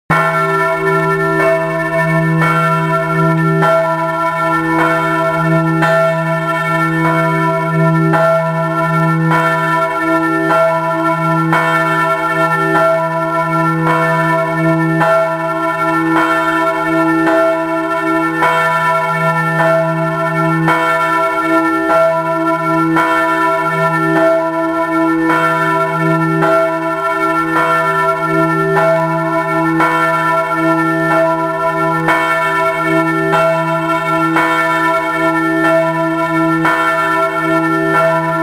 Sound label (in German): glocken